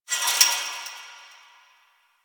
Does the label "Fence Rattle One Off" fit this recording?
Yes